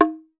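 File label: African cowbell; Voice Crystal - Steve Reid Definitive Percussion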